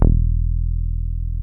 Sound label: BASS, Tekno Industrial; East West